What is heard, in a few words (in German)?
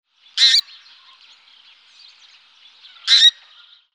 Kurzschnabelgans Anser brachyrhynchus; Vogelstimmen